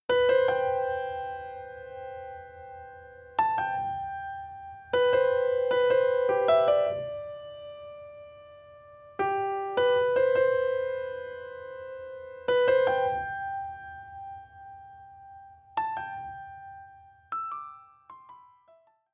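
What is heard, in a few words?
Reverb Room; Mix Send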